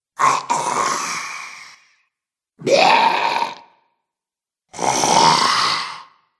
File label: Zombies Selection, Zomby Sound Effects; horror